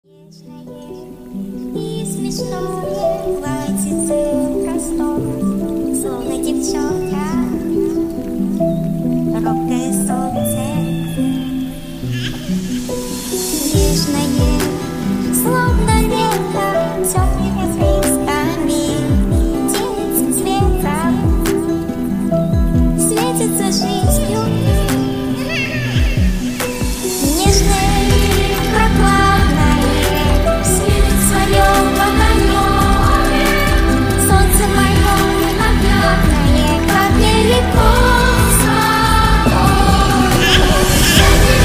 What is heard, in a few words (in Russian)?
детский хор; детский хор фестиваля Белый Пароход-2023